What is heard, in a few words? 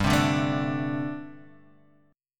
D 7th Suspended 4th, G